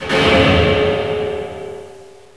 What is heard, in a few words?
scary; valve